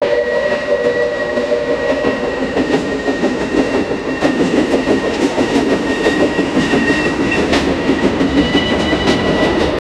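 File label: old train like 1940s movie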